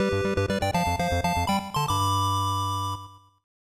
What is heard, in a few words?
Begin Bonus Level; Gan Gan Ganchan; SNES